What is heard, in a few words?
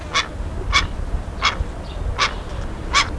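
Guira guira adult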